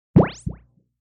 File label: SFX (Zap); Zatacka 360; xbox 360